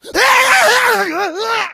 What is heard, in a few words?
become enraged, infected